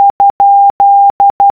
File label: Morse Code - Question Mark; Locale RS6